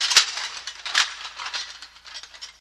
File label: Plate Armor Clink